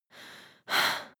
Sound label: breath female slightly aroused